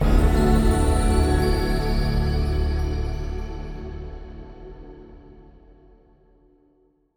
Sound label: Stingers sting gain huge; Hand of Fate 2